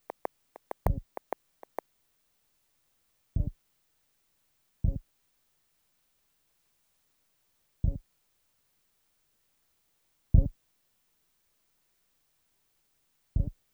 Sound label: 030bpm; elektron machinedrum